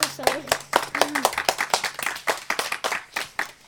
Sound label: Short Applause